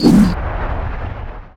charge, movement; spirit breaker

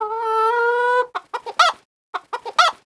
taunt chicken; weapons; dota; skywrath

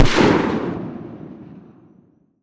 rocket explode; ambient; newyear; dota

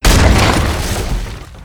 engine destruction; damage; engines

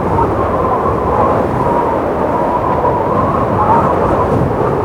wildkin tornado loop; weapons; dota; creep; neutral

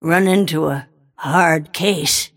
death; snapfire

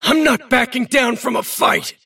attack pain; davion; dota

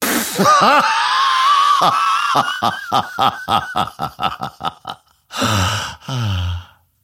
grimstroke laugh; dota

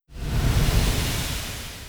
spiderling hatch; weapons; dota; broodmother

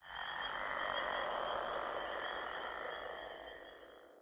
frog chorus; ui; stingers; dota

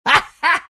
laugh; dota; gyrocopter

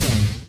range projectile launch; weapons; dota; creep

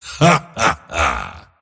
mars laugh; dota

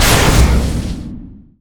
chain frost impact hero; weapons; dota; lich

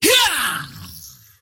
toy pug attack emote; dota; pudge

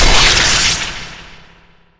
heavy sword impact; sven; gods strength

